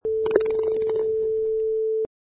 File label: Golf Ball in Hole